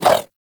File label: Infested Throwing Star Whoosh; Weapons